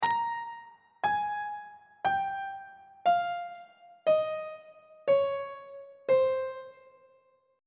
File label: b sharp phrygian mode descending on treble clef